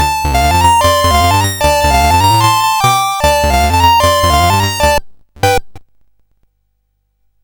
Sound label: sid CSG8580R5, GAMES; Continental Circus